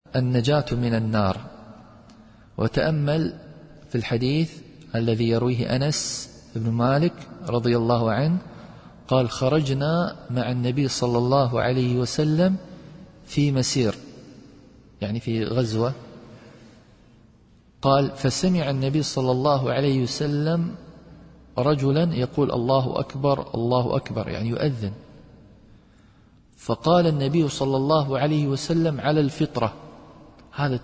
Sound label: azan; muhafazat; najat min nar